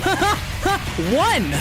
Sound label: haha one; Sonic 06